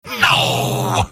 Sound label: soldier negative vocalization; tf; mvm